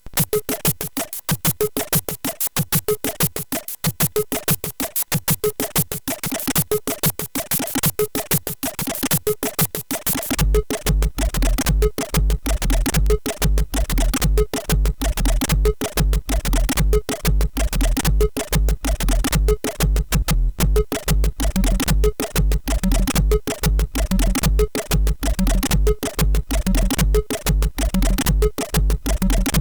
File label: Jungle Beat, sid, CSG8580R5